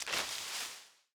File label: gather fruit